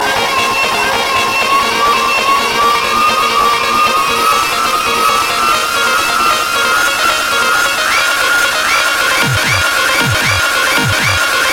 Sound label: Babys Singing